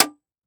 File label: bullet fly by impact metal; Weapons